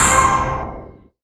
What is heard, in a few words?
C5, Synth Line; 90 s Sample CDs; NiceBeats; SynthLine VOL-11